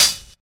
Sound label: subtle closed hi hat sample d key, hat tuned to the D note; hat samples